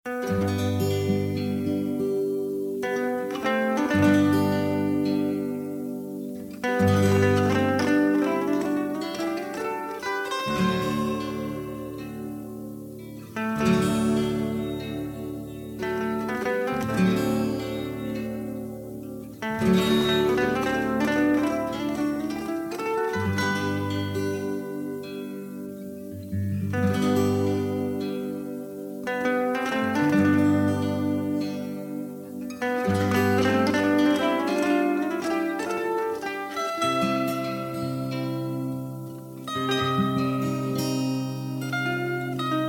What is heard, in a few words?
zither; 60s